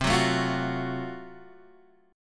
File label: F sharp Minor Major 7th Flat 5th C